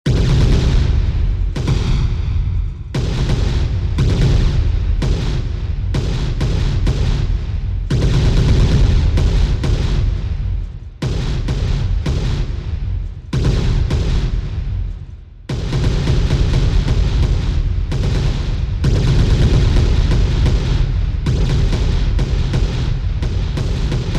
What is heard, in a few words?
War Zone, Heavy sound of artillery in battle ground